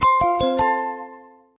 Tinkle Bell; LG JN240 Remarq; Message tones

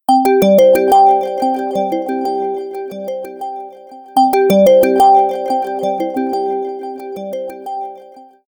Ripple, alarms; Huawei P20

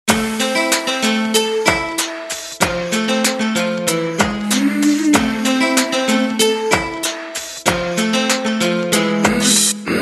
Pattern, Motorola W755

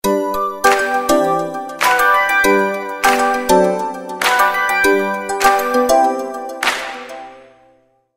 Colorful, alarms; Meizu MX2

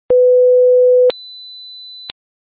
2kHz 2sec mute 1sec 500Hz 2sec; LG X Cam F690S

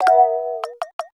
recharge battery; Nokia N9

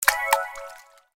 Water instant message; Nokia; Audio themes

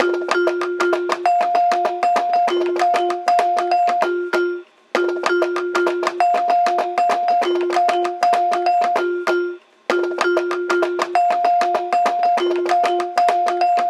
ringtone jammin; The Light Phone II

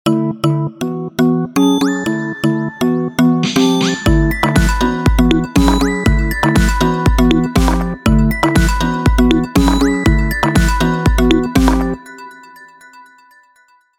Pop Strut, ringtones

